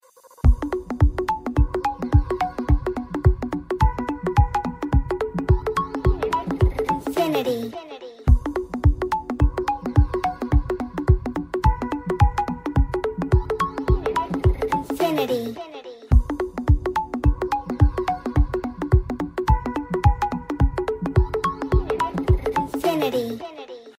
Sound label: Ringtone